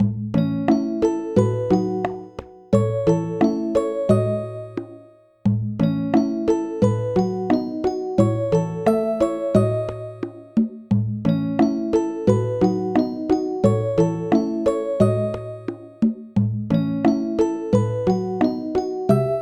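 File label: background music lobby; ovenbreak 5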